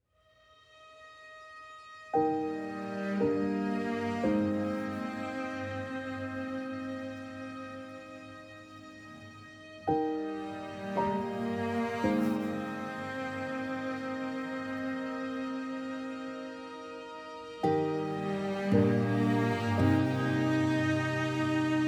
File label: MAJOR IN DEEPNESS 85bpm